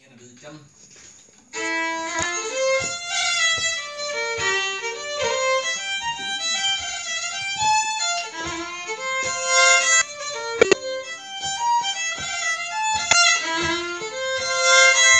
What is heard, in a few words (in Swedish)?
schottis från enviken